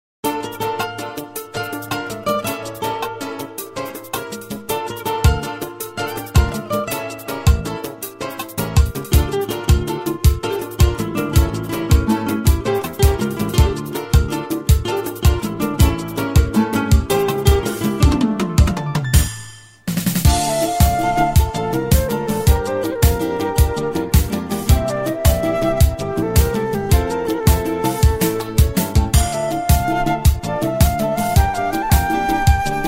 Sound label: Instrumental